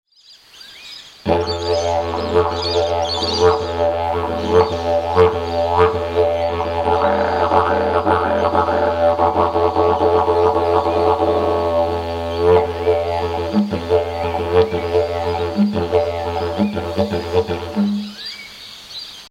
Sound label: didje